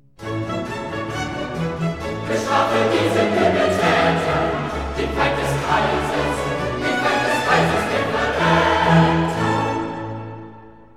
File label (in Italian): Coro; Bestrafe diesen Übeltater; Handel; Brockes Passion